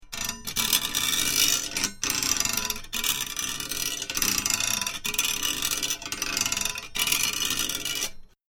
Small Metal Chain Rubbing; Chain sounds; Metal Sound Effects

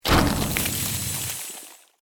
Created Magic Spell Ice Bolt; Series of created spell sounds

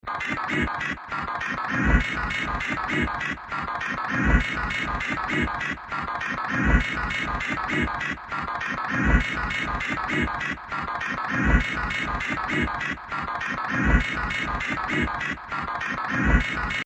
Future Fantasy Machine Loop; Scary Soundscape; Fantasy, Horror And Sci-Fi Games; Andrew Fleming

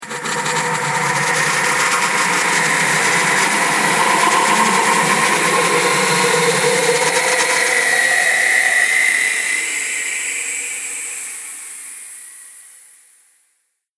Depraved Horror Transition; Scary Soundscape Sound Effects

